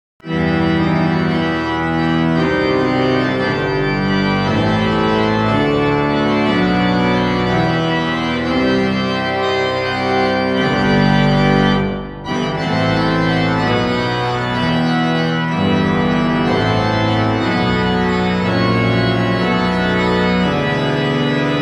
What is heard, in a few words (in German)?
Impro e moll